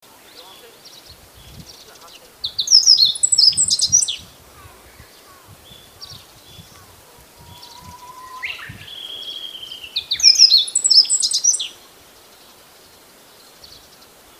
hojiro

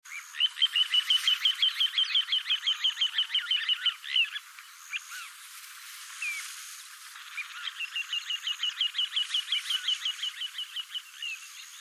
ｺﾁﾄﾞﾘ

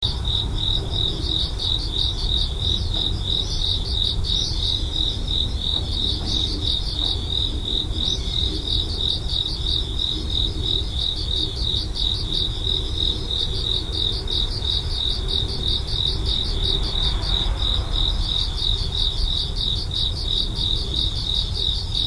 korogi, Insects

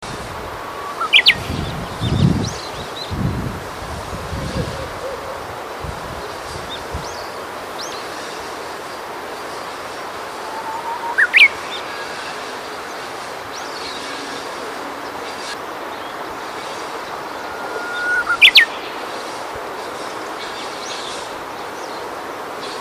uguisu, birds

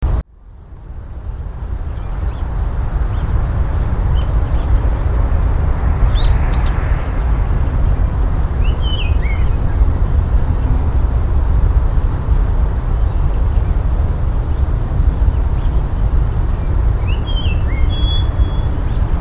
ikaru, birds

